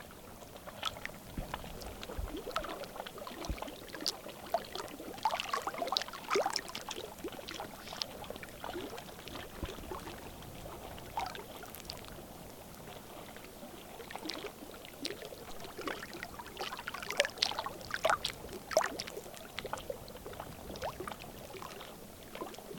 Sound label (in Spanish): laguna azul, Cajamarca; Laguna Azul, Cajamarca, 2022